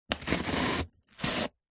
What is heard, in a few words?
foley cardboard box cut stab and remove utility knife; zapsplat